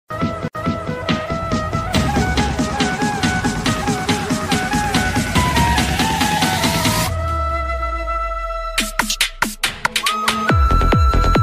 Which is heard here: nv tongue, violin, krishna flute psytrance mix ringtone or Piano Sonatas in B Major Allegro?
krishna flute psytrance mix ringtone